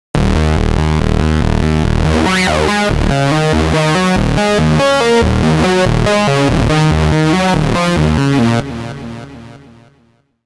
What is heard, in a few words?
Zombie, Korg